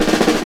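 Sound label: snare drum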